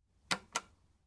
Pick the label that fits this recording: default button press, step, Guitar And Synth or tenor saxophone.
default button press